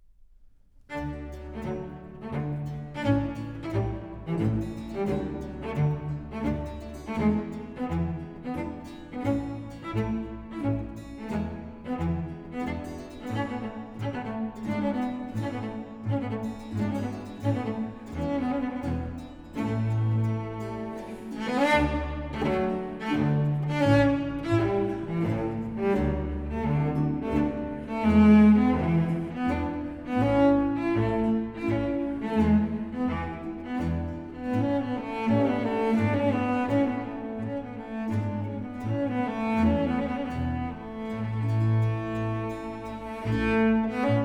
Cello Concerto, for cello, strings & continuo in D major, Andante; RV 403; Andante e spiritoso; Vivaldi